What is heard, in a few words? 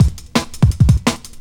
170, Drum&Bass Classics Breaks; EZRollers